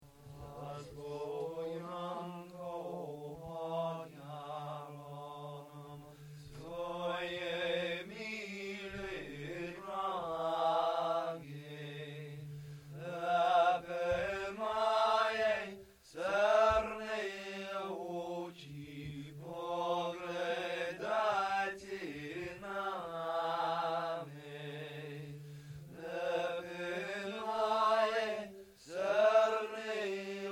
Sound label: Zaspo Janko Alto; training recordings